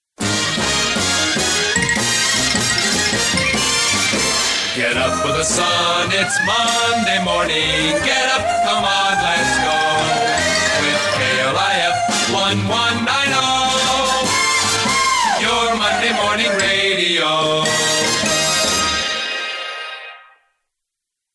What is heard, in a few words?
Jingles Versatiles; Futursonic; Demo Tape; Futursonic Productions; 1963; CKLW